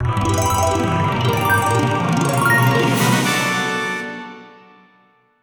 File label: Space Minigame Pinball intro